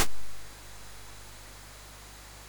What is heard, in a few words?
sid MOS6581R2, GAMES; Face Off